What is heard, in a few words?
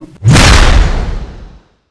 knife hit wall